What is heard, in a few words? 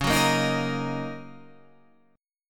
Eb Minor Db; Ebm/Db strummed; JGuitar